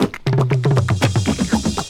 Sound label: BBox & Tom Roll, Drum Kit